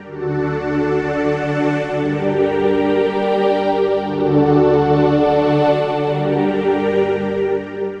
Cinematic Pad; Pads; Dr. Fink's Funk Factory

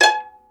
pizzi total, stringed; East West; Global Sounds

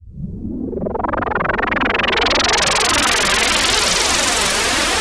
Juno-60, Filtered Noise

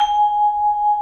3D TB BELL; E-MU Producer Series Vol. 5; 3-D Audio Collection; 3D Multis; 3D Sprint Select 2

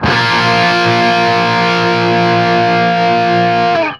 5TH A#2, Guitar; 5THS; East West; Steve Stevens Guitar Samples Collection

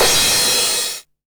909 CYM Tape Sat; Goldbaby Tape808 & Tape909; The Tape 909

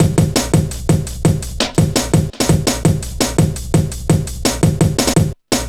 Step Ez, Drum Loops, Jungle, 168.5; Zero-G Jungle Warfare 1, 2 & 3

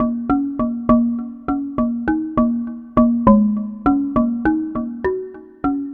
Marimba Low

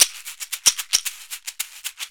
Caxixi Beats; Roland LCDP14 Africa VOL-2

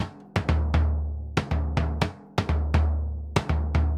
Bombo Bomba 120, Drums & Percussion, 120 bpm; Best Service; Festa Latina VOL-1

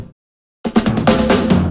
Fills, Loops 140 BPM

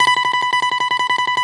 balalaika, Russian strings; World Combos; E-MU Producer Series Vol. 7 – Old World Instruments

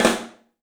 STEEL ROLLS; 3D Drums

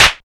PRC SCLAP

